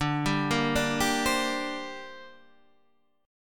D 7th Suspended 4th Sharp 5th, down

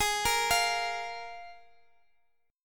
F Minor Double Flat 5th Ab

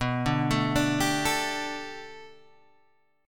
B Minor 7th Sharp 5th B, chord; Bm7#5 Chord {7 5 7 7 8 5} arpeggiated

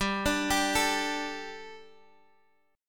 G Suspended 2nd G, down, Guitar, Half Step Down

